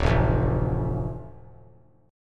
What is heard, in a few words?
D Minor D, chord; Dm strummed; JGuitar